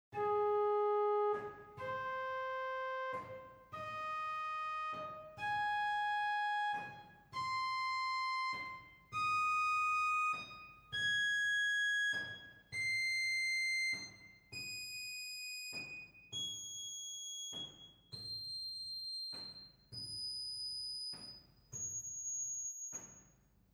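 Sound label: Quinta arpeggio, organ; Reinhardtsgrimma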